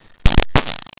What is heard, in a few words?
fart